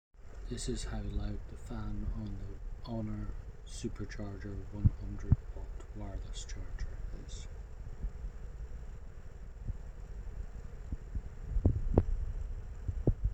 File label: Fan Volume on Honor Supercharger